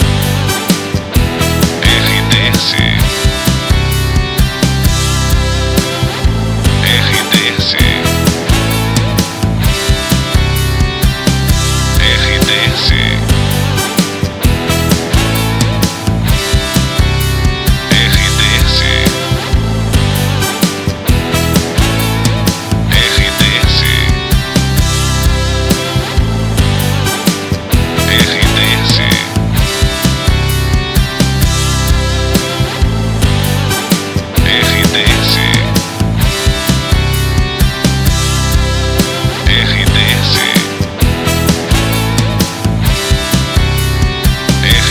trilha para locução; Oeste

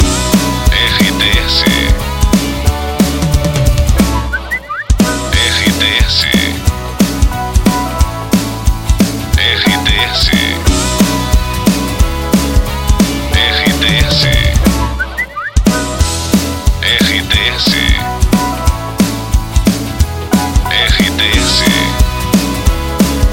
trilha para locução

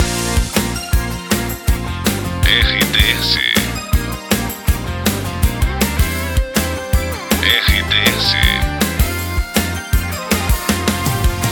TRILHA LOCUCAO; Expresso

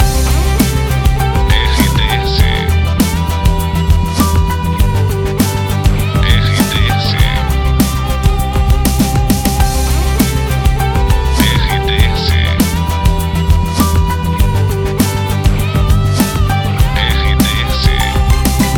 trilha para locução; Pacote Cidade FM, Pato Branco/PR Emissora com perfil Jovem

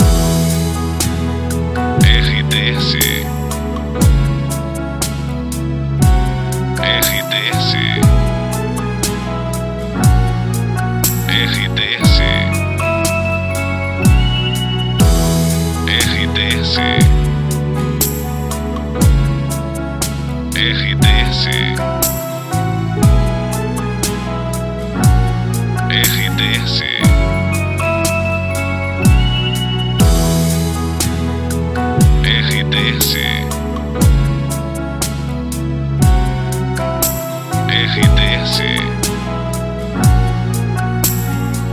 trilha para locução; Santos FM

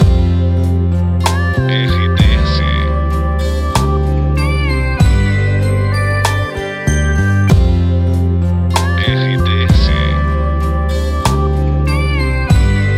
trilha para locução adulto; Web Net; Web Rádios

